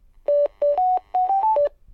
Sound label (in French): Telephone GIGASET DA710 Sonnerie